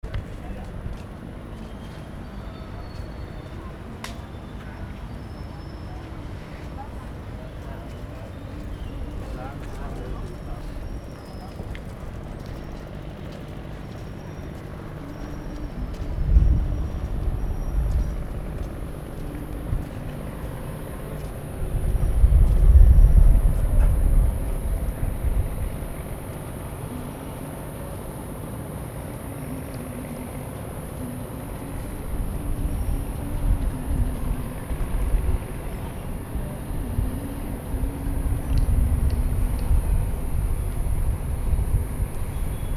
ambient nothing space outside, Cambodia phnom penh; 2019